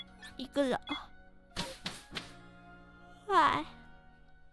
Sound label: Yawn